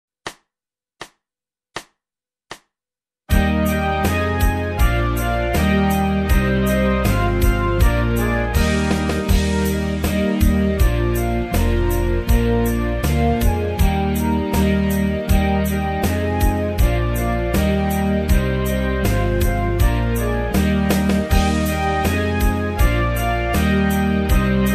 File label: Dance With Me Englisches Volkslied, keyboard solo; keyboard solo in b transpose; Schulband Musizierklassen